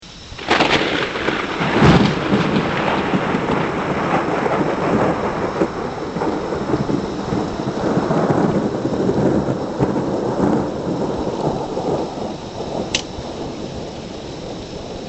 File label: thunder